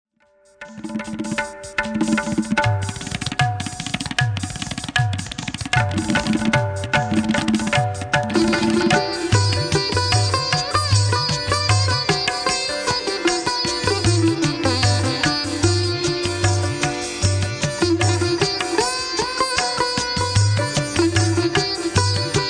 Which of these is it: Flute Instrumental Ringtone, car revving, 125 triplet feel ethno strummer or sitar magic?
sitar magic